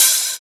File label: Open Hat; FLPRO